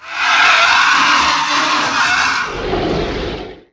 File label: facehugger dying